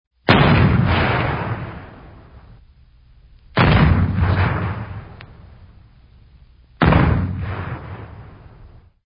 armi

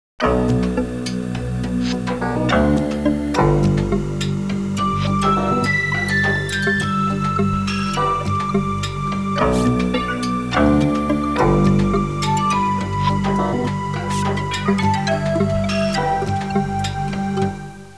musica tecno; techno

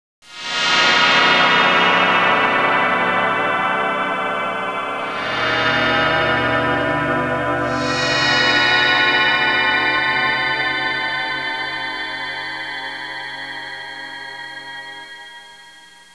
musica newage

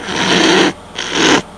persone russare

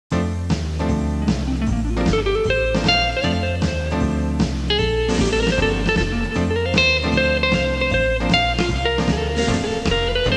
suonerie musica jazz

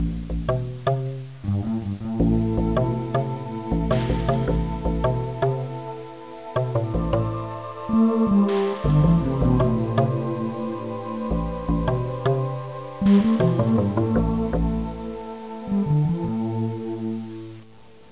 musica newage

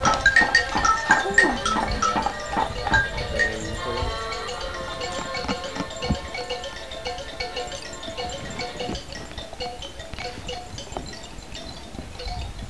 suonerie ambiente

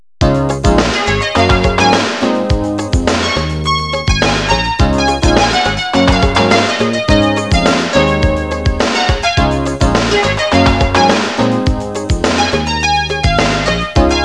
suonerie businessy

